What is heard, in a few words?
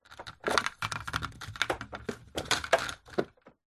household blind venetian movement; zapsplat